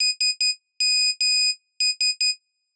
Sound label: cellphone sms signal; teardown; ps5 windows xbox series xs